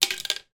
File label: household manual razor drop in bathroom sink